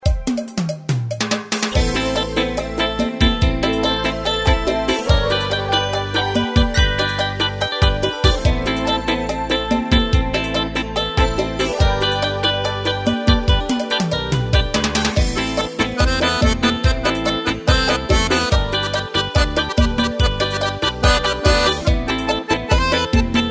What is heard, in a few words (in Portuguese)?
arrocha romantico; micailritmos